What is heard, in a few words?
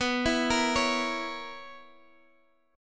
B Major 7th Suspended 2nd Suspended 4th B Guitar Half Step Down